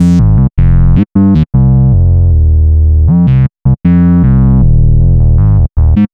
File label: Synth Bass